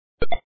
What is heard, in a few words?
fail